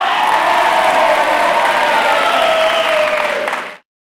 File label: Crowd Cheer; Pitch Black Audio Pong